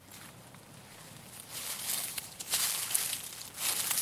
shepot zemnoy podstilki, oseni

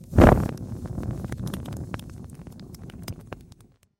fakel dokumentalnyiy

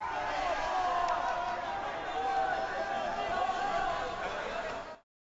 massa lyudey ojidanie u dvortsa

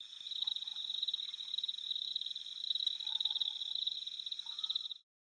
vodom nochyu nochnaya simfoniya, zvuki ozera

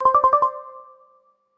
melodiya messendjera; skype

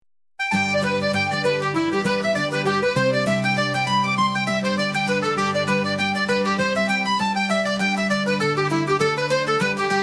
volinki

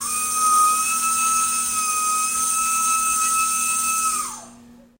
zal ojidaniya spokoynyie zvuki, zvuki stomatologa

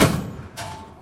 metallicheskiy schit gromkiy

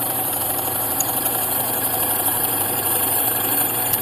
pesnya kinematografa, zvuki kinohlopushki

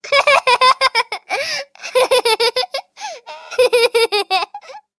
malyish hohochet bez ostanovki

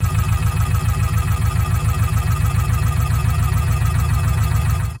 dvigateli bez nagruzki, lunohod

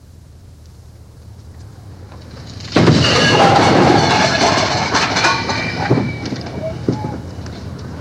tsunami zabveniya, zvuki atomnogo vzryiva

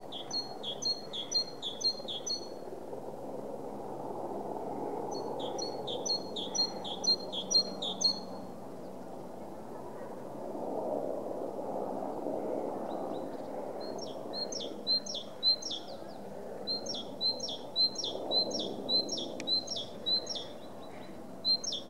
energichnaya pesnya samtsa v brachnyiy sezon, sinitsyi

